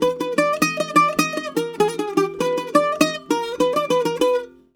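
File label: G#, bouzouki; USB Soundscan vol.53; Mediteranean Traditions; AKAI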